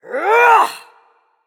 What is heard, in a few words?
grunt attack; smite; ymir